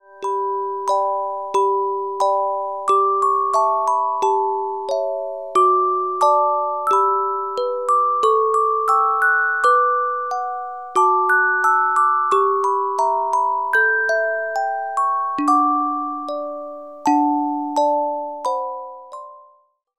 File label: Carillon Music Box Melodies